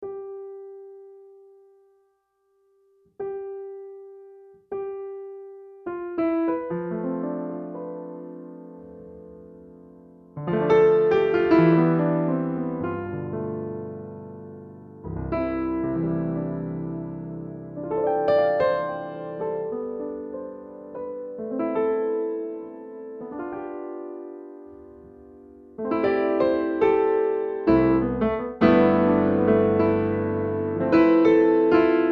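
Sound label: impro layer, Pianoteq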